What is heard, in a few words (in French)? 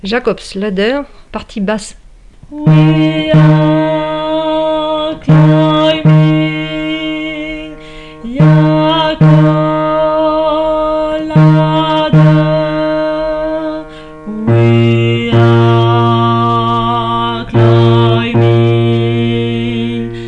Basse; Jacobs Ladder